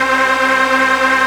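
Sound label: SYNT -R, synth, Drum & Bass, 160 BPM; Ueberschall; Drum & Bass Resonance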